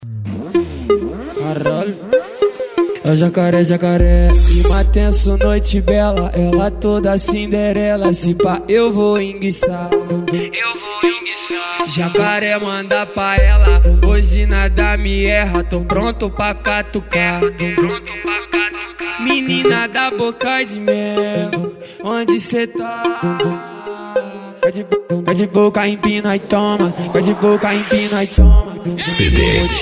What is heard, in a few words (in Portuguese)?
eletrofunk com piseiro; cardoso audio car